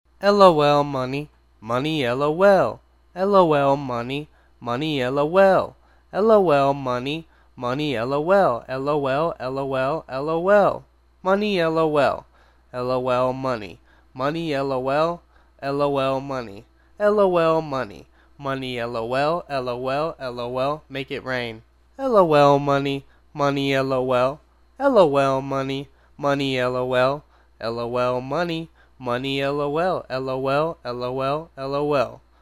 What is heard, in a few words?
lol money (acapella)